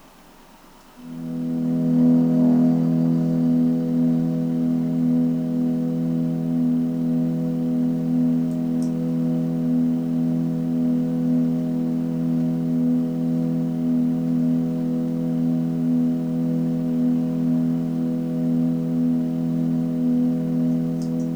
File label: Ambience; Ambience for April